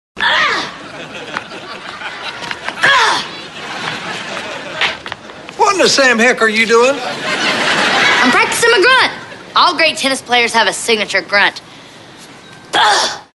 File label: grunt